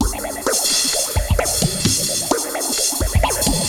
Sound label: Moog Phaser HR-16B (130BPM), cyborg beats; musicradar